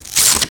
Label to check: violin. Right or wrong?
Wrong